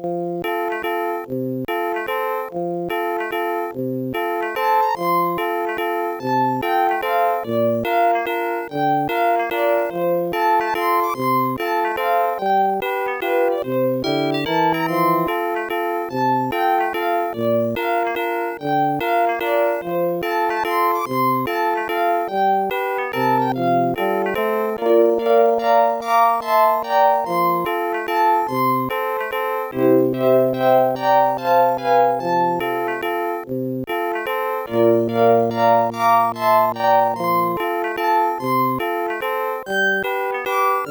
waltz; The Music Studio

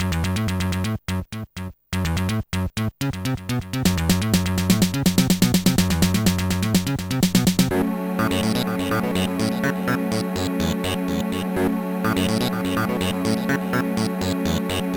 octamelody; MED; JaDe

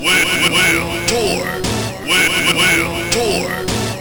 beatbox; Pinball Dreams

